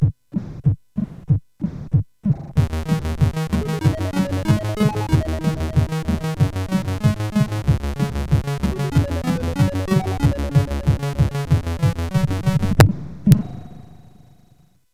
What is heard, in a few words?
sid CSG8580R5; X Files Slideshow Intro